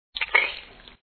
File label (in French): rampe